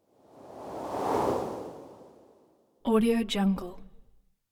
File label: cloth whooshes slow whooshing pass bys airy hum